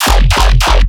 bass hit hat trick F; Virtual Riot

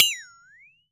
FLEXATONE; AKAI S-Series CD-ROM Sound Library VOL-5

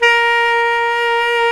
SAX TENOR, Ballad; Roland L-CDC-01; BBand Ballad

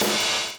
DOWN, DOWN KIT 78

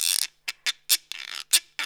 Roots Reggae; Sounds Good - Roots Reggae

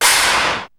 TORQUE RENCH; Hollywood Edge; The Big Bad Beatmachine; HR-16B

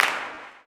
CLAP, blues & soul; ILIO; Vocal Planet

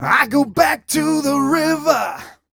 m 128 mel e-flat, Vocals

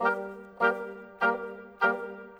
Bassoon Oboe; Sonic Foundry (Sony Creative Software); Orchestral VOL-2; Modern

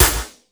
MONDO SNR, METALIC DRM

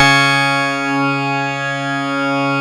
C#, PIANO; Big Fish Audio; Synclav FM

